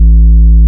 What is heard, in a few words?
SUBSONIC A1, BASSES; Best Service Alpha Dance vol.2